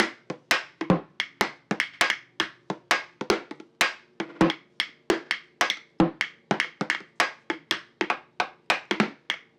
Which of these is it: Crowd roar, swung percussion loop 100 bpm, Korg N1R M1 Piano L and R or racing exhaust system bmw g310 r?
swung percussion loop 100 bpm